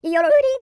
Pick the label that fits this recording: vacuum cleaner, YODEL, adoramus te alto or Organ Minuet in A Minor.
YODEL